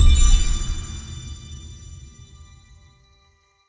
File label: small Win; netent